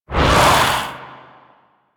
Symbiotic Link Cast; Warframe; Warframes

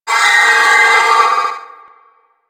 Trooper Attack Long, Vocals; Warframe; DE; Sentients

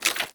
Generic Footstep; Warframe; Dagath

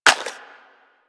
Shotgun Reload Handle Foley; DE; Warframe; Weapons; Skins; Zoboz Shotgun Skin

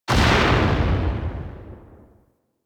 Hand Shotgun 2D Tail, Weapons

